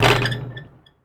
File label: Street Ball Glass Rim; SFX Kit